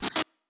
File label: Glitch, Electric